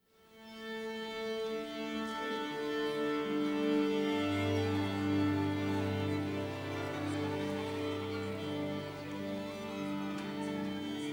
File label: Orchestra Tune Up